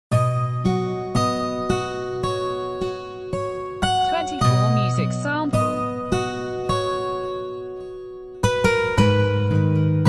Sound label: guitar samples